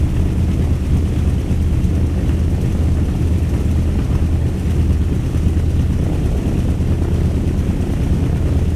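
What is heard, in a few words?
b25 cockpit right, engines; War Thunder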